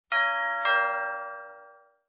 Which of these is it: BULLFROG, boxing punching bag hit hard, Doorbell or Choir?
Doorbell